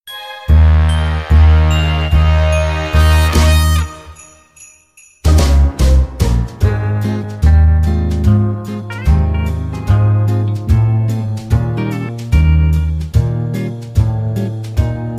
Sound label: ACCOMP